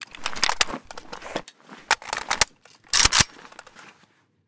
ak load; ak74u; weapon

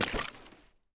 water footstep; metro

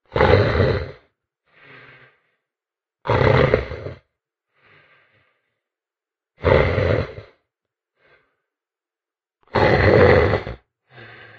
sleep; customnpcs

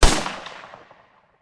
an94 shoot, weapon

